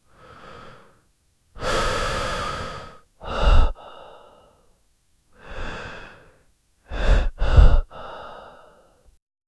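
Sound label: sharp quiet intake of breath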